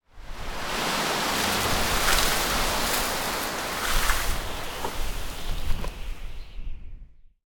ambience foliage; smite; conquest

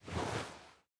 armor; smite; baronsamedi skin7

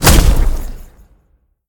aoe; smite; tiamat

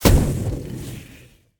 hit fire; smite; god merlin

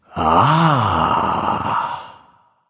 "ah", discover; smite; voicepack; ullr

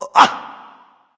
surprise; cuchulainn

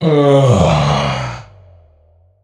sigh; smite; heimdallr

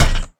footstep; Smite; god Kuzenbo

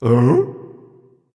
nv confused; cupid

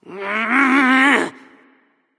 grumble; smite; nezha

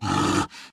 zombie run; smite; npc; roman archer

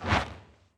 swing slow; smite; odin

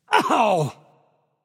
ouch; smite; heimdallr

